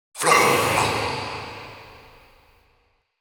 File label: Processed Battle Shouts, Viking Vocal